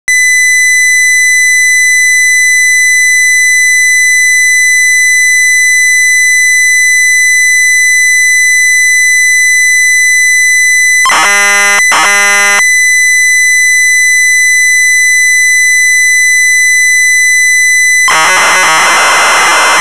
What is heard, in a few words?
ALARM CALL; Knights Computers; MZ-80A; KNIGHTS LIBRARY